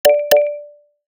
Nokia Message; X100

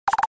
Keytone hot; SU780 Lollipop 3; LG

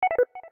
Low Battery; Alcatel OT-903

